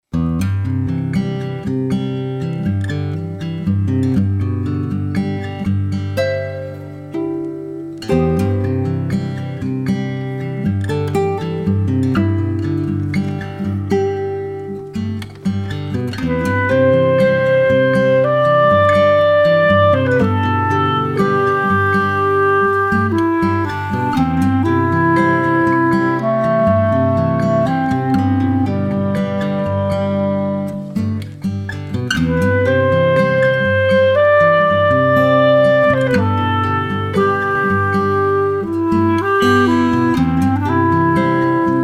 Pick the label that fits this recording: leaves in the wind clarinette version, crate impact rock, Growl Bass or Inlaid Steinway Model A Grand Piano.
leaves in the wind clarinette version